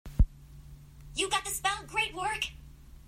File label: success cue